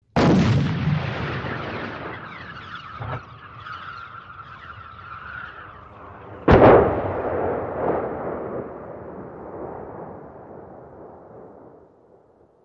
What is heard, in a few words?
105mm Howitzer: Fires Projectile, Downrange Explosion. Stereo